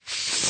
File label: slide tear